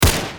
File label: Deagle